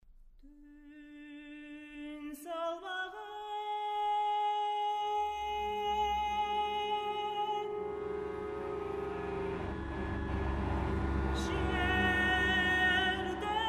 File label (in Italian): voci